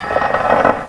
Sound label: pull